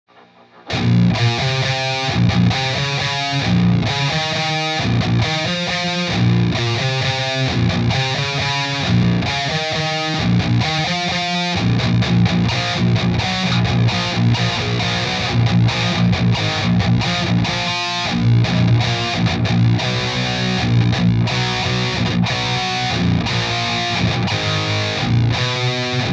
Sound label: 6L6, 6060, Mesa 212, E906